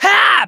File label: kiai; MonsterHunter; Fight